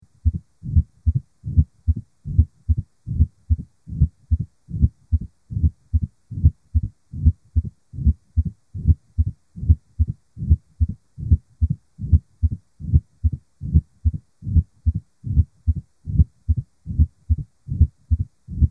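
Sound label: Apex, Opening Snap & Diastolic Murmur, Left Lateral Decubitus, Bell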